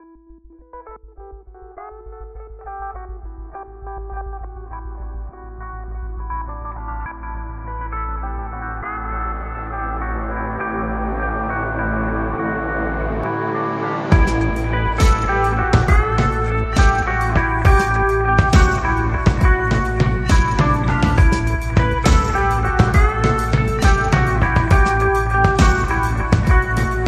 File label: Short Version with Drums